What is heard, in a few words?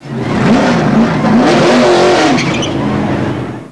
chevrolet